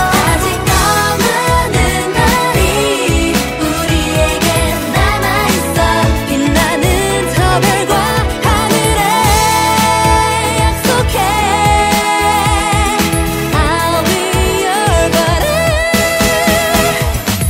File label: Chorus